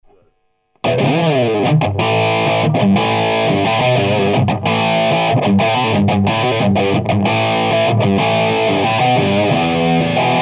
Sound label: lead